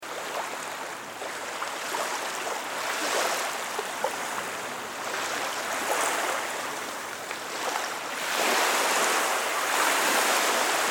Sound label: sea waves